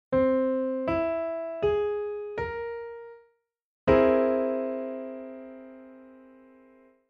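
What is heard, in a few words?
chord piano aug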